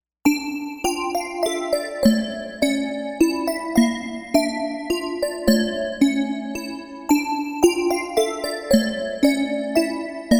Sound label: Space bell, synth